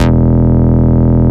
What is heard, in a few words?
Root E, Bass